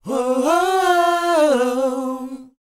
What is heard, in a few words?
WHOA C, Vocal, R&B & Dance; ILIO; Vocal Planet